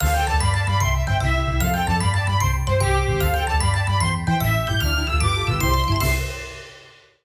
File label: Stage Clear Dance; Nintendo - Kirby's Epic Yarn; Video Game OSTs